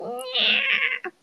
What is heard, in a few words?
cat impression; noises; SpaceRyS